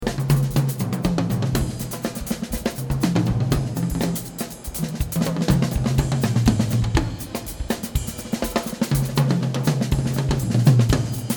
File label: Family Drums